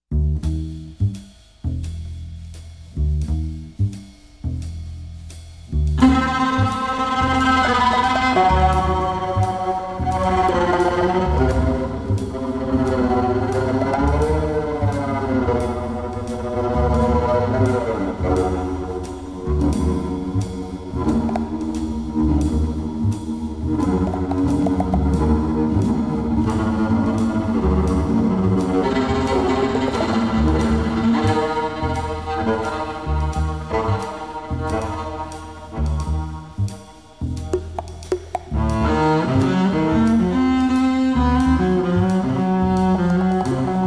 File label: Multi Bass